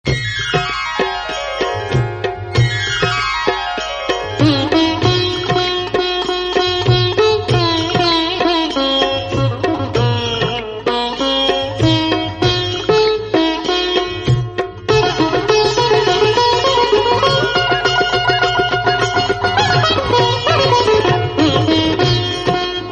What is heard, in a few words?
Shiv Dhun Om Namah Shivay; Om Namah Shivaya; Shiv Dhuni